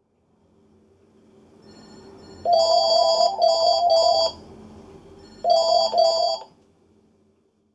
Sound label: Sub phone